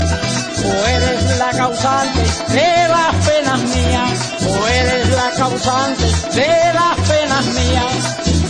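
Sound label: Spanish Ringtone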